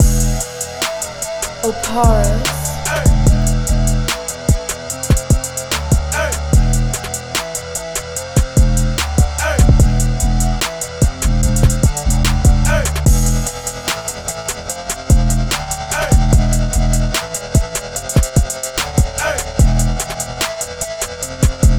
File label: 73.5BPM